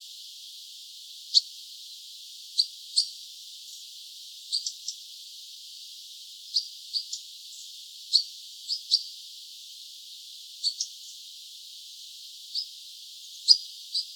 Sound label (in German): Trauerschnaepper; Ornithologie